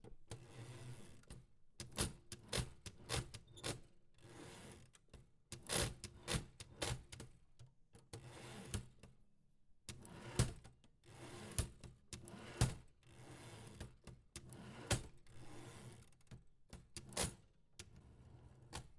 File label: Type Machine